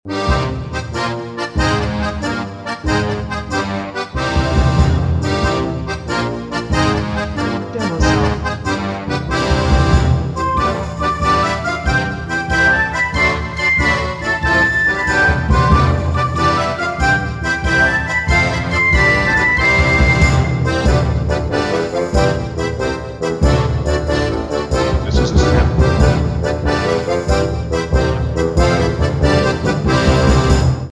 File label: Drunken Sailors Tavern Loop